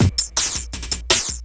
Break; Blu Mar Ten; Jungle Jungle - 1989 to 1999 Samplepack

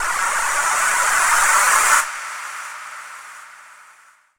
Hiss; Jungle; FX; Blu Mar Ten - Jungle Jungle - 1989 to 1999 Samplepack

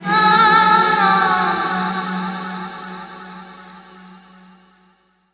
Dreams Vox - 11A; Jungle; Vocals; Blu Mar Ten - Jungle Jungle - 1989 to 1999 Samplepack

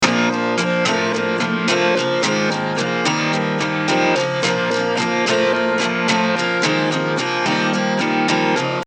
109 Bb; CRATES & BREAKS VOL.1 - BEEJ

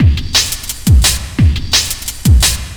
Warned Break, Breaks, Jungle; Blu Mar Ten - Jungle Jungle - 1989 to 1999 Samplepack

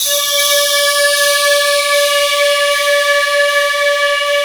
Wash Pad, Jungle; Pads; Blu Mar Ten; Jungle - 1989 to 1999

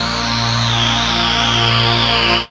Tone Drone; Jungle; Blu Mar Ten - Jungle Jungle - 1989 to 1999 Samplepack

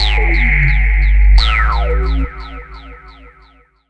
Bass - 11A, Jungle; Blu Mar Ten; Jungle - 1989 to 1999

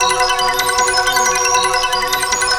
Arp, 10A; Jungle; Riffs, Arps & Hits; Blu Mar Ten; Jungle - 1989 to 1999

